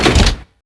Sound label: Elevator Doors open; Max Payne